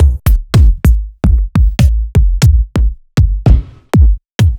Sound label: Bassdrums; Sliced